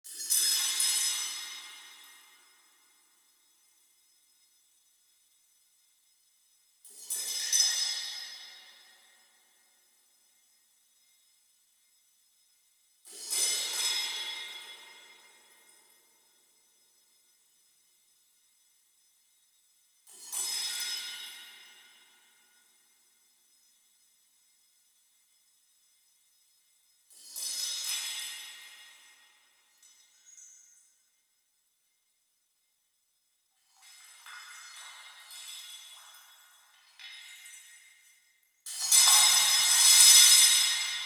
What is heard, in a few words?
Splashes Glassbreak; Chalice Well